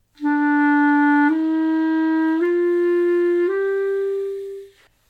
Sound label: clarinet